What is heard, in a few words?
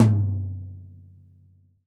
TD-20 Tom A, Roland TD-20 Toms